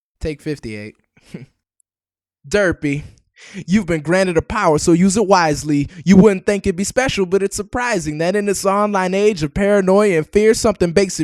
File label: vocal; Acapellas